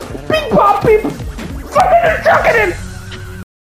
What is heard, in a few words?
beep bop beep